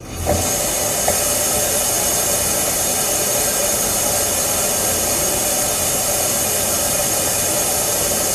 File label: Water Fountain